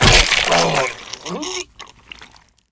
gib; SINDEMO; player; blade

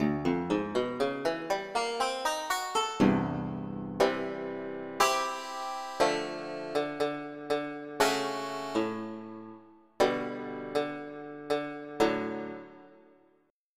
Banjo; EverQuest

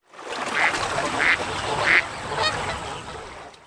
Pond; buildings

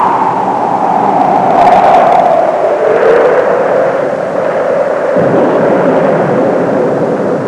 sandstorm; Sound Library 2000; Wayzata Technology; Nature

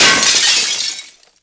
med gls, impact glass; SINDEMO

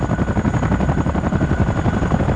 Chinook-Loop, Helicopters; Platoon; Vehicles

